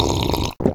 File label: Drinking